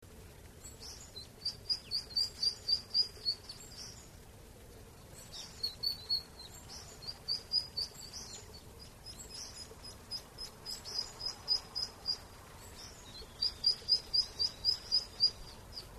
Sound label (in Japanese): karugamo